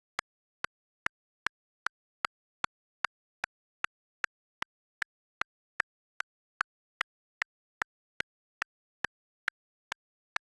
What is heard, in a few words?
clapper bored